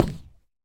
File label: deactivate, shelf